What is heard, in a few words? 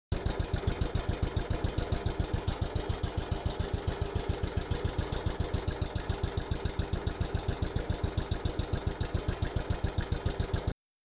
ms-1 idle